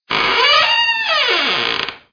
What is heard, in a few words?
Creak; Five Nights at the Chum Bucket